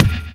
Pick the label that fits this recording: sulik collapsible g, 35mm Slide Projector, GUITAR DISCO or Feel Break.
Feel Break